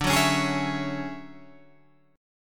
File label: D Major Sharp 11th D Guitar Open G, chord